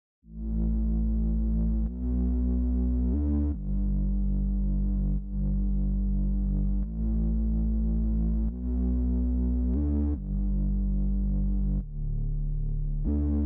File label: 145 BPM D Maj Bass; Loop Stems; Cymatics; Miami Guitar Pack - Pt 2; Shooting Star